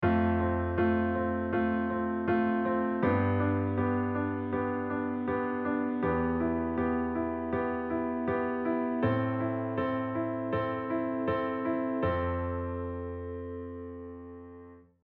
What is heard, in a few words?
comping